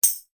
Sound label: tamborne